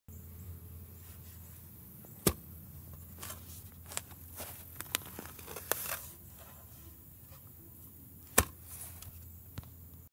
garden trowel

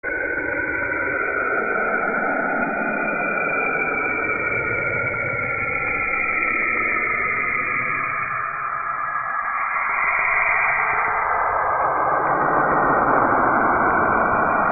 Symphony of planets

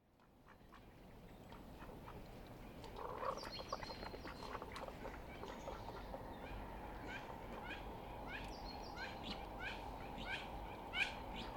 Mandarin duck; اردک ماندارین

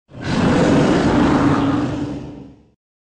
Yeti, هیولا; یتی

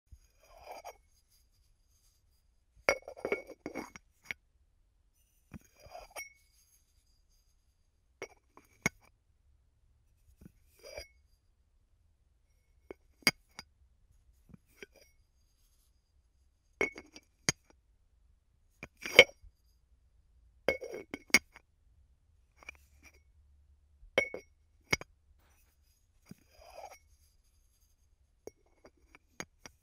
Chinese dishes

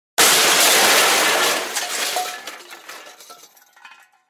Metal debris

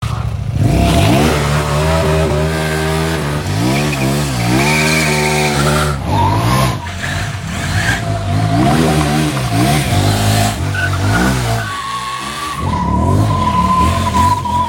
KTM, اگزوز

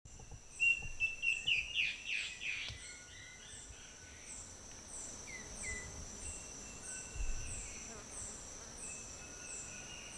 Guianan warbling antbird

